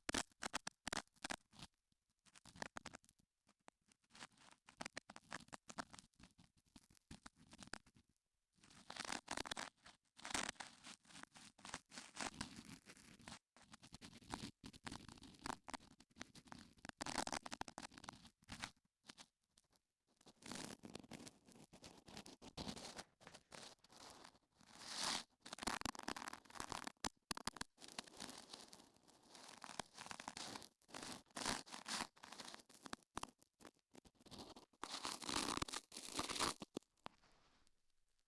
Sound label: scrr